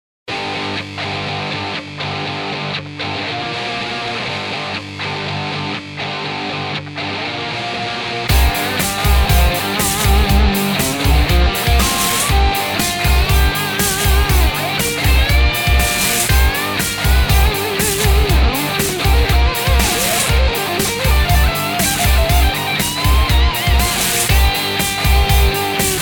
Rock Guitar Attack

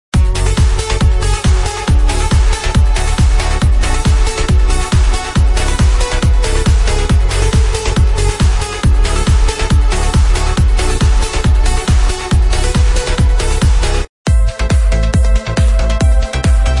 MELODIC UPLIFTING TRANCE; MADNESS SONGSTARTERS

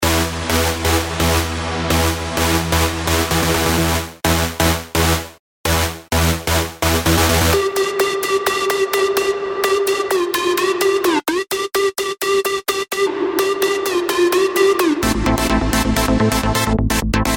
EDM Reverb Presets Big Room; HighLife Samples

